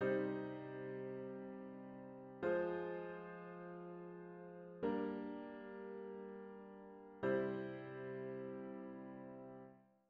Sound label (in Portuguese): Estudo de Harmonia acorde de sexta cadência de picarda